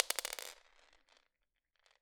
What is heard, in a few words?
Drop On Floor, Medium Wine Glass